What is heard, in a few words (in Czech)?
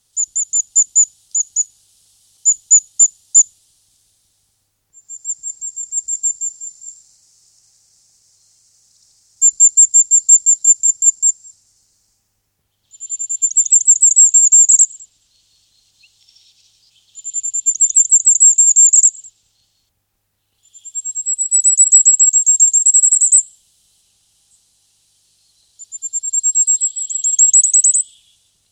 králíček ohnivý